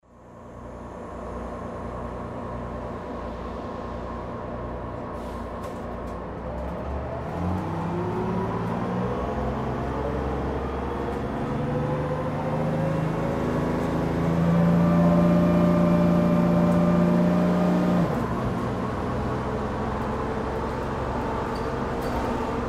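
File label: sound inside a bus; Автобус закрывает и открывает двери, езда в автобусе, шум двигателя Общественный транспорт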